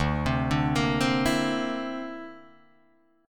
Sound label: D 7th Suspended 2nd Sharp 5th, Guitar Drop D